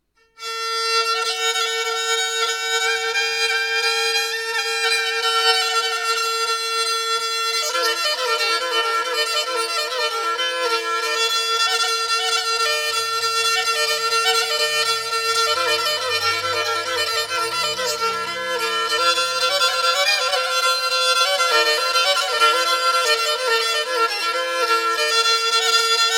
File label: kemence; Solo Improvisation; Black Sea Fiddle; featherweight 3-stringed fiddle; supported by thumb & palm of right hand & tuned in fourths